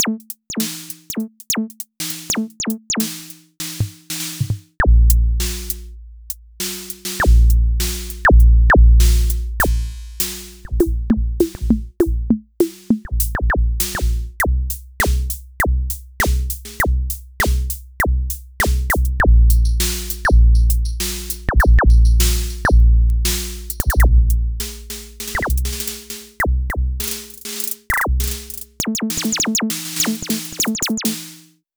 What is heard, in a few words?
drum module; jwp homage line drum module